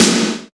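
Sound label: Reverse Snare, Roland BK-5; Snares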